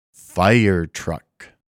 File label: Fire truck